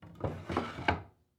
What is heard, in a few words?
Household Board Cutting Board Pull Out